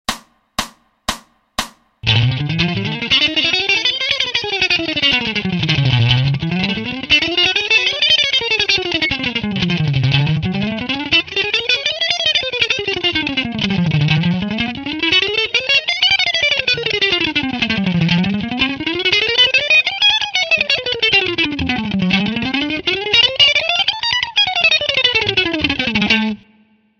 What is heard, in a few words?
Pick & Fret Hand Sync (Sx Tup FAST